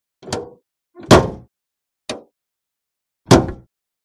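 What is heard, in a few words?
Door Open Close Model T Ford, ford model t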